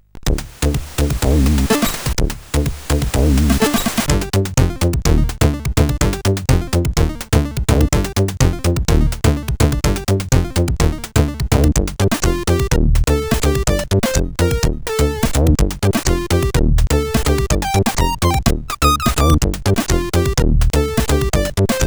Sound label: Jazzgo, CSG8580R5